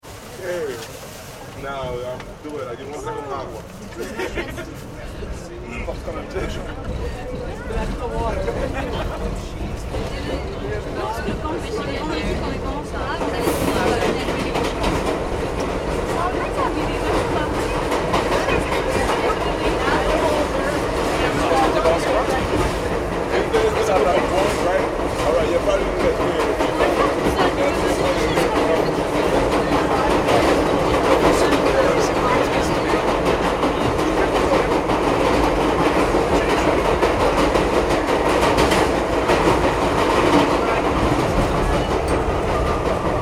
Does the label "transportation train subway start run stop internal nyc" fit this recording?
Yes